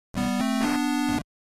Arcade game 16 bit or 8 bit level complete win success; Success Sound Effects; Video Game Success